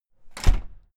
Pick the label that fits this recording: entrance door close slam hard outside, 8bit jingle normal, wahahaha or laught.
entrance door close slam hard outside